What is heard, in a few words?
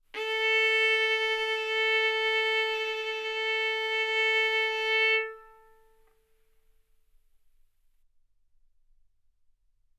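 Violin arco mf A string A4 B4 stereo; sul A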